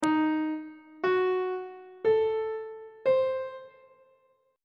d sharp diminished 7th chord intervals on treble clef